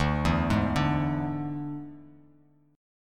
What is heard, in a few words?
D Suspended 2nd Flat 5th D down Bass Standard; arpeggiated